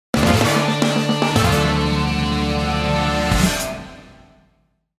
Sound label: cool jingle chord